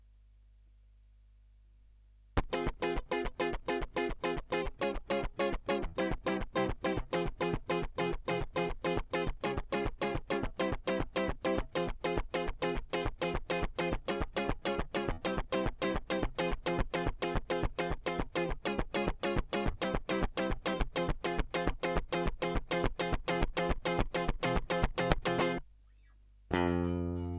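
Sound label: Guitar Line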